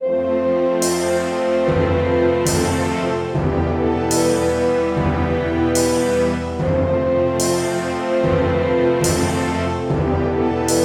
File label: Epic Movie Ending Symphony